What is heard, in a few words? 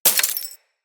Bullet Impact Debris Glass; Weapons